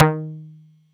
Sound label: Pizzicato d#2, Yamaha YX81Z